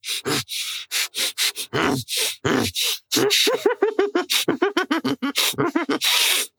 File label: inmate grab